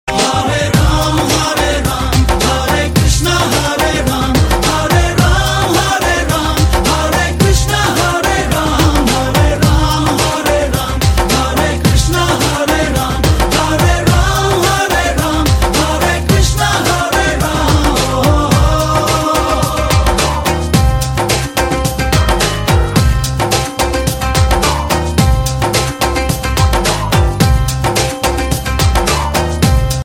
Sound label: Mobile tone